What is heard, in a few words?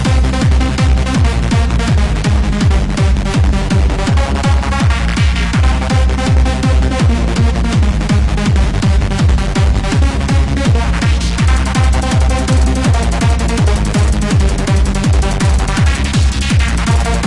hardcore trance